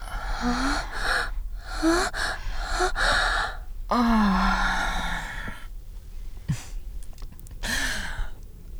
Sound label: woman moaning and reaching an orgasm